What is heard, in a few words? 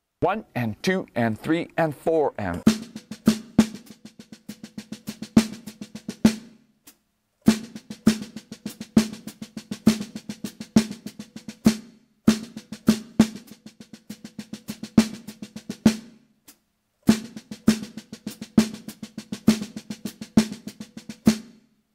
Melody 16th Notes